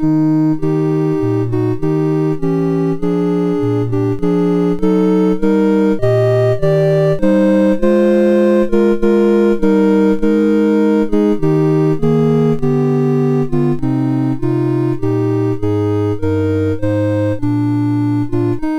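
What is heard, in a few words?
I Heard the Bells on Christmas, MOS6581R2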